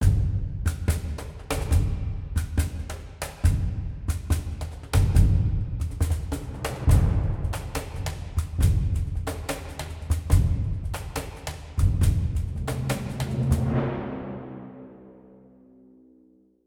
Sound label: Battle Drums; Necropolis